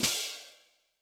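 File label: Cymbal Open; Yamaha DTXpress-II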